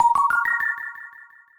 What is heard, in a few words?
creepy jingle; ralsei